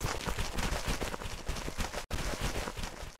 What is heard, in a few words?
zombie digging; mvm forever